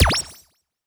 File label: Button Spin